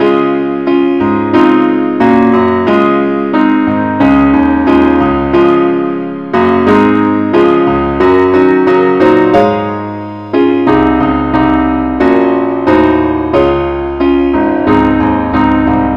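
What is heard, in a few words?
keyboard only key c sharp; christe Sanctorum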